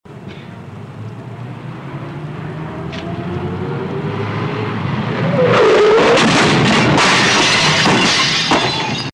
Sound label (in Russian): zvuki avarii solknovenie neskolkih mashin